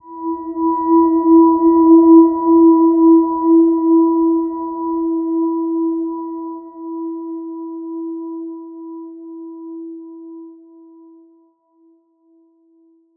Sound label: Silver Gem E4 p; healing soundscapes